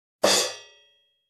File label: Drum crash stopped